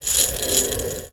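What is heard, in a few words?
impact metal med; Cars; XBOX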